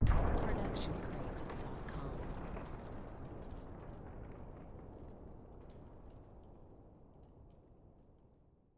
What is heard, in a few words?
Explosion Blast with Debris; SoundsCrate